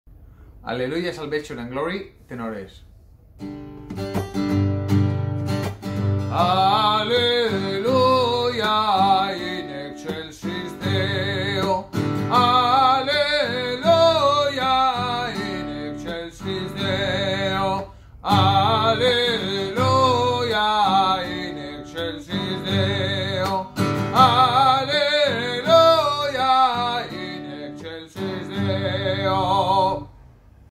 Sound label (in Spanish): Halleluyah salvation and glory tenores